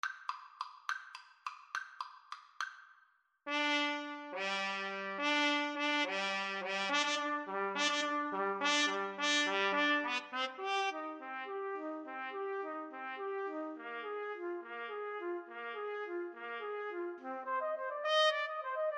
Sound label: trumpet duet